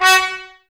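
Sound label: Brass trumpets F#, Mute; Miroslav Vitous - Woodwind Brass Ensemble; Tps Mute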